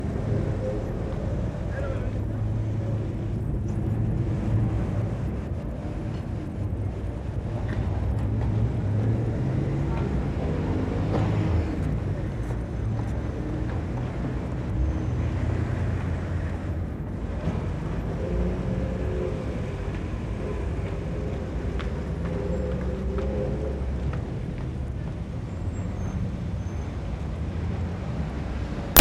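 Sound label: high street; bbc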